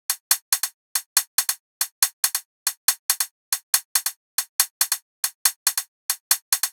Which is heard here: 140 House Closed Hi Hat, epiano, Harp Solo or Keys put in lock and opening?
140 House Closed Hi Hat